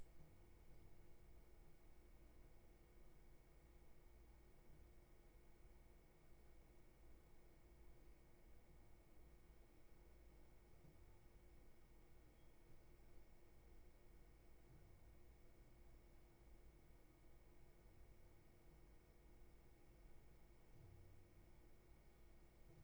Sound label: roomtone